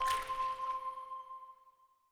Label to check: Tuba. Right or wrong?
Wrong